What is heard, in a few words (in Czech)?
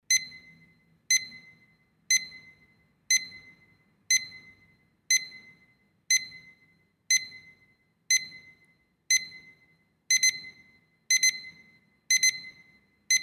ukazka zvuku alarmu